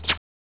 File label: kiss